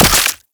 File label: Brood Spider Attack; Warframe; Oraxia